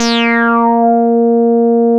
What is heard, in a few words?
A#4, Bass; CRANDLE; E-MU Producer Series Vol. 6 – Analogia Project (CD 1); Boom da Bass 1